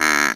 Animal Duck Mono; blastmorph pinball; xbox series xs

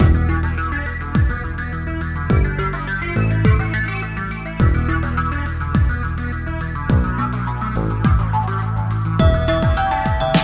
Casual Arcade Track Looped; Dual Blade: Battle of the Female Ninja

